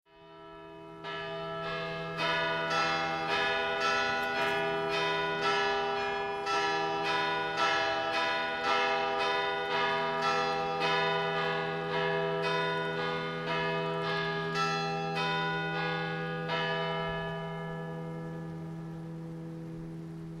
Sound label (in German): glockenspiel mariahilferplatz; Graz; Abwechslungsreich klingt das Glockenspiel am Mariahilferplatz